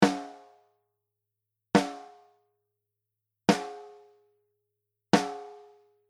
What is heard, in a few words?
Snare dry